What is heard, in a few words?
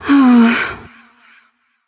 sigh; bellona